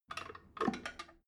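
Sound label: drain valve close heavy, bathroom; haus garten; badezimmer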